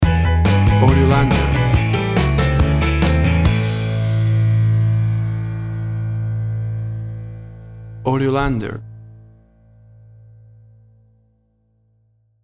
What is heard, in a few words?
Country Rock and Roll Boogie Stinger